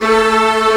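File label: STR A0 FF, strings; Sound On Sound - Peter Siedleczek's Orchestra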